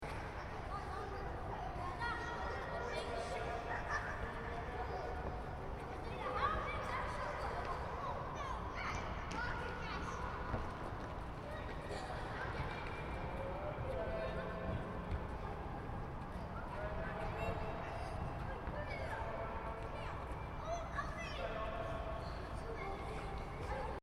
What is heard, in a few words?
Sports Hall, Interior: Ambience, Kids Five-A-Side Soccer Game, Reverberant; Backgrounds